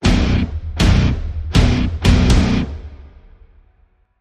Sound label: Stab Guitar Phrase (B)